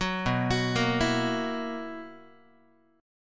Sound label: B Major 7th Suspended 4th B down Guitar; arpeggiated; 9,7,9,8,8,x